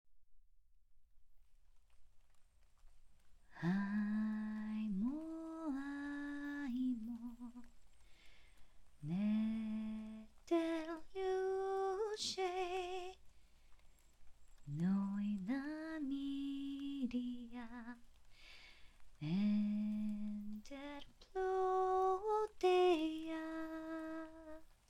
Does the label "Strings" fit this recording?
No